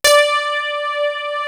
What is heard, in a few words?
acid piano; East West - Global Sounds; S.I KEYS